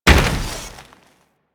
Combined Step Snow, Footsteps; Enemies; Warframe

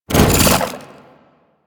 Jump, Arachnoid Wraith; Warframe; Corpus; Abilities

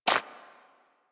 Bird Of Prey Step Dirt; Warframe; Footsteps

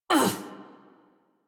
Pain Adult; Warframe; New War; Adult Operator

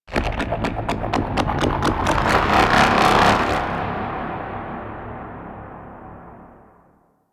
Void Tower Capture Started; Warframe

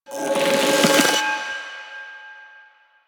Choir Bow Charge; Warframe; Weapons

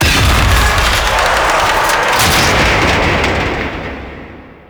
End Arm Destruction Two; Warframe; Corpus Gas City; Ropalolyst; Boss Arena

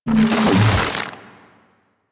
Stone Gaze Cast Stop; Warframe; Brawler

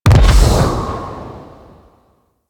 Void Comet Appear; Warframe; Void Storm; Railjack

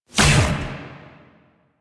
Quick Draw Shot; Warframe; The New War; Quests; Weapons; Omicrus

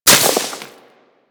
Melee Impact Dirt; Warframe; Weapons

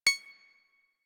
Bridge Comp Beep; Warframe; Liset; Hub

